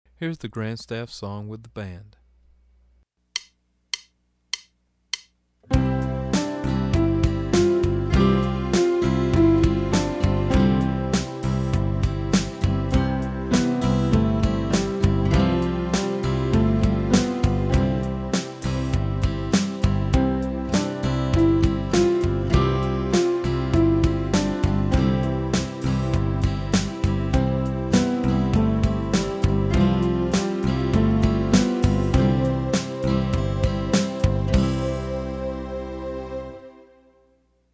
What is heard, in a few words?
Fast